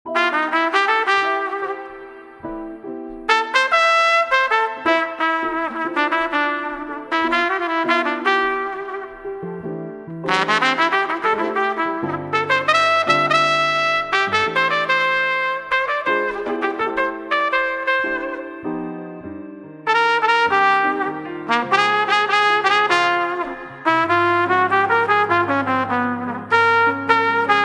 Light Jazz Coffee House

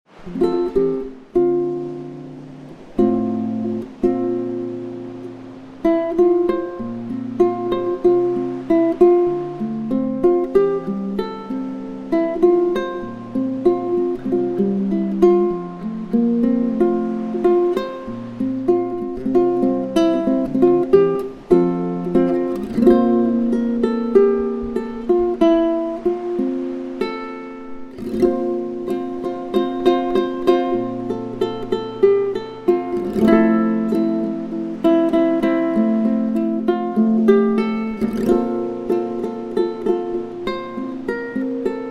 Healing Relaxing BGM Ukulele Hawaiian BGM

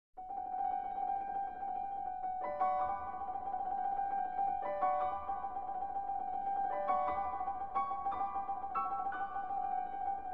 Barcarolle (Arr. For Piano); The Tales of Hoffmann

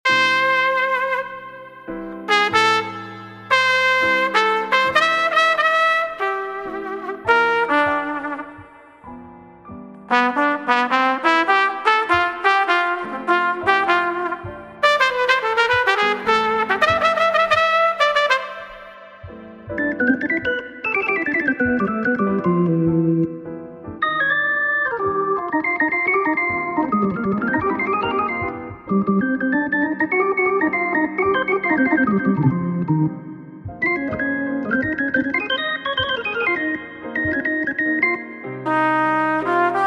French Jazz Lounge

